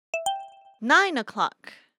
Hourly Alert

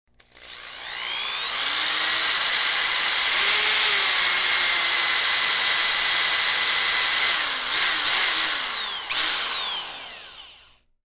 Power Drill; Microsoft; Windows Mobile ringtones; WrongTech

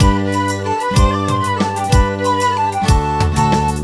World, Medieval Jaunt, ringtones; Google; NewWave Labs

